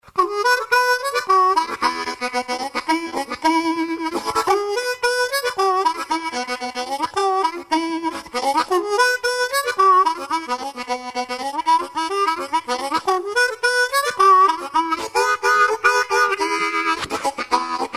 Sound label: Harp how-down